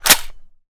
chamber, weapons m16; arccw ud

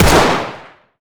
fire, glock; Urban-Coalition; Decay; arccw ud

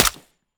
mech, m16 weapons

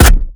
mech last, m1014; weapons; arccw ud

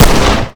fire, glock; Urban-Coalition; Decay; arccw ud

